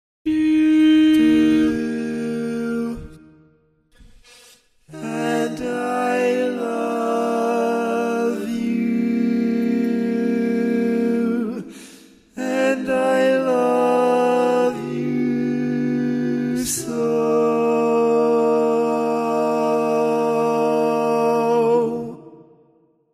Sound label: Bari, barbershop tags